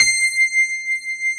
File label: DPF C6; AKAI S-Series CD-ROM Sound Library VOL-2